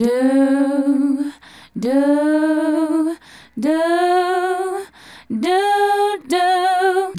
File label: Female Group, Hip-Hop and RnB Vocals